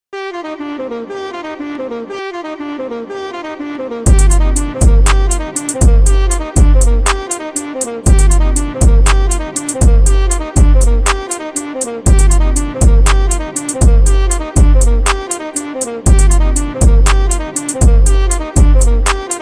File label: instrumental